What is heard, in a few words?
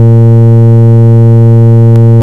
ENSONIQ MOOG